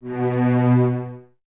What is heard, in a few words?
Cellos B 2, String Ensembles; Miroslav Vitous; LINKS ORCH